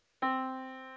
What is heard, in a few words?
yamaha Disk